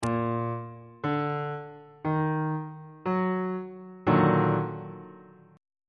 d sharp minor major 7th 2nd inversion on bass clef